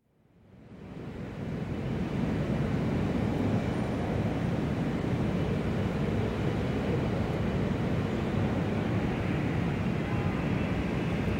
Large Airport, general exterior atmosphere (Recorded in roof-garden observation area); Airport Sound Effects; BBC